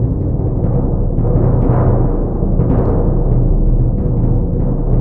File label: PRC CBD, Orch Bs Drum